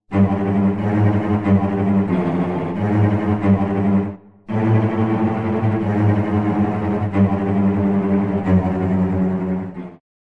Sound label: Strings Cello Tremolo; Synthphonica; Syntheway